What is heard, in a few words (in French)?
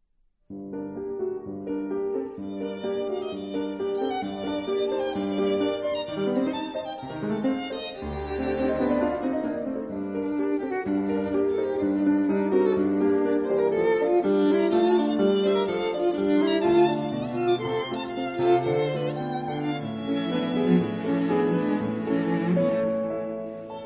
Quatuor piano